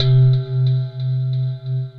Crazy Bowls, Broken Keys; Instruments; Ableton; Factory Packs; Mood Reel

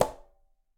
Quinto A Slap Open, Conga; Latin Percussion; Drums; Drum Hits; Ableton; Factory Packs

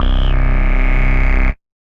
Octave Bass; Essential; Synth

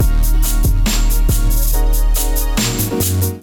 groove 140 bpm; MIDI Clips; Ableton; Factory Packs; Beat Tools

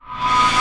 Reverse Reverbs, IR Samples; Effects; Convolution; NI Kontakt 5; Deadline Flyby

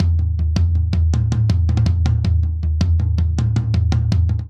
Straight Six Rock Toms Only 6_8 80 bpm; Ableton; Drum Booth

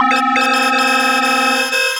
Underwater Phone, Synth Lead; Ableton; Creative Extensions; Racks

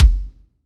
Kick, Superior Drummer 3; Toontrack

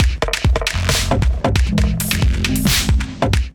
Kit Melodic 135 bpm, Drums; MIDI Clips; Big Tune Fish Kit; Ableton; Factory Packs; Skitter and Step

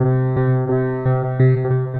Sitar Plucks; Guitar & Plucked; Ableton; Factory Packs; Granulator III

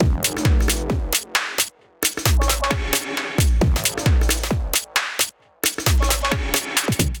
Kit Melodic 133 BPM, Drums; MIDI Clips; Blowin Kit; Ableton; Factory Packs; Skitter and Step

